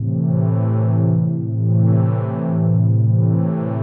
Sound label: DEEPHOUSE ORGAN 125 A; Keys